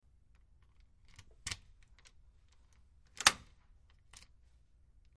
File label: Lock And Unlock Bolt In Glass And Wood Door With Key, X3